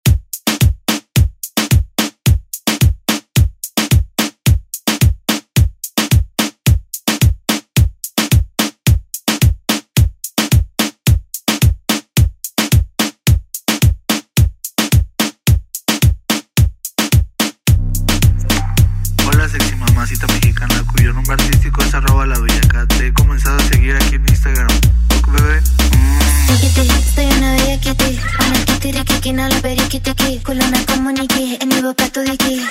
Latin Urban Redrum snippets; Deejay Hit

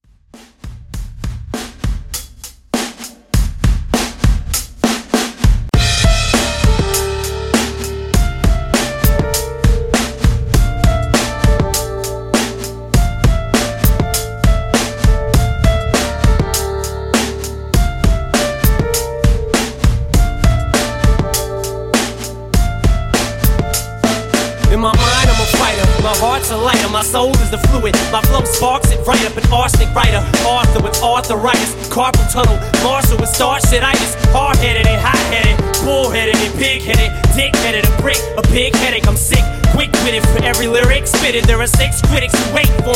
Redrum 100 Bpm

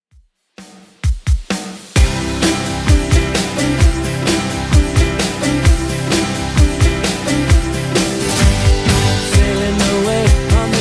70s Redrum 130bpm

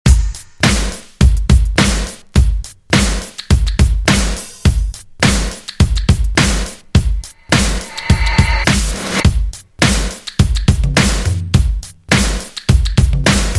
Pop 80s Redrum 104 Bpm

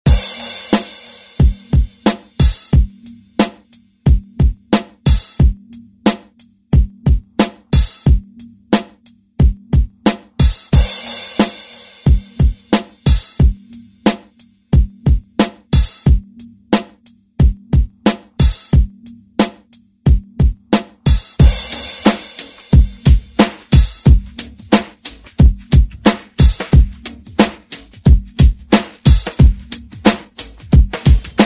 Latin Redrum

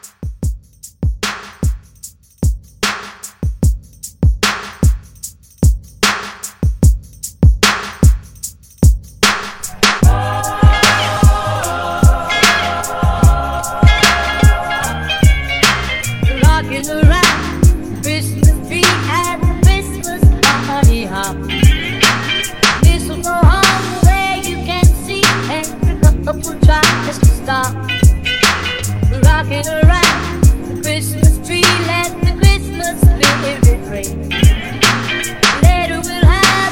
Re Drum 75; 2023 Holiday

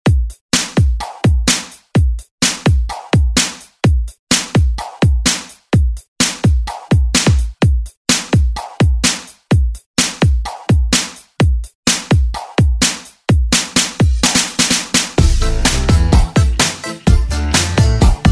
Hip Hop Redrum; Deejay Hit

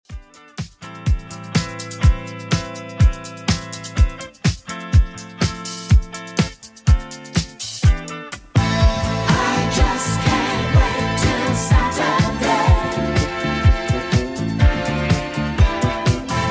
70s Redrum 124bpm CLEAN

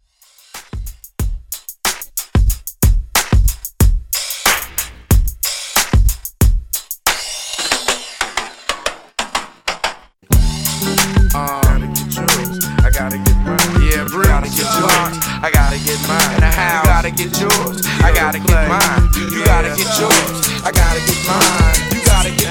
Old School, 92Bpm; Redrum

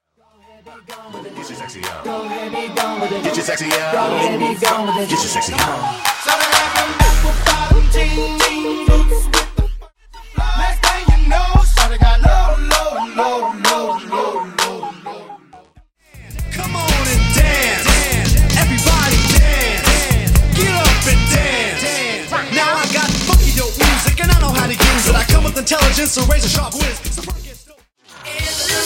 Hip Hop RB Old School 128 93Bpm; Magamix

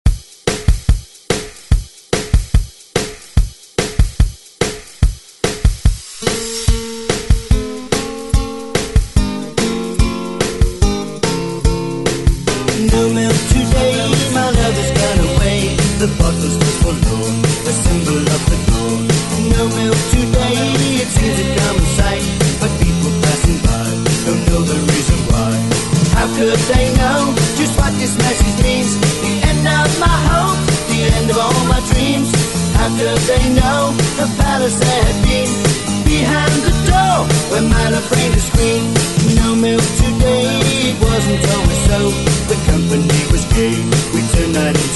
60s ReDrum; DJ Mhark

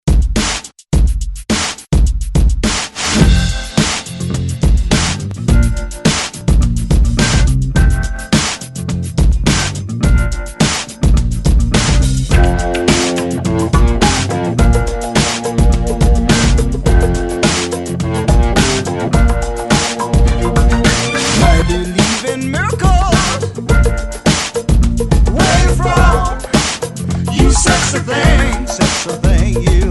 70s ReDrum 105Bpm; DJDOLLS

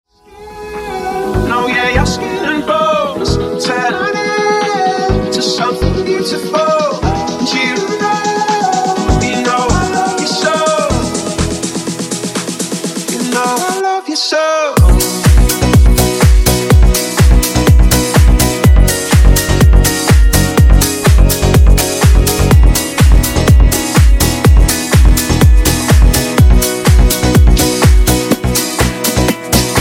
Funky Remix 124Bpm

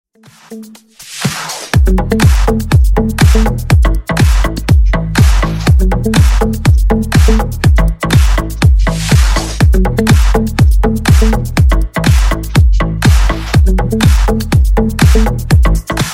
Funk Remix 122 Bpm

